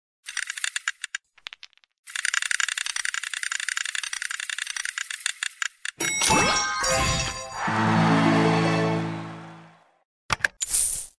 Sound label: spin